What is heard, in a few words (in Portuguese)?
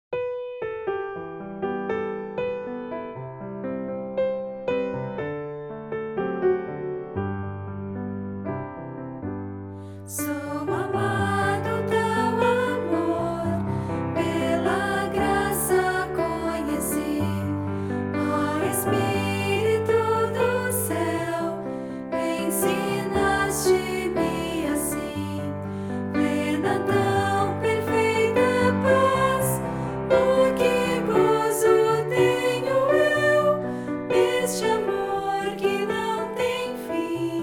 voz piano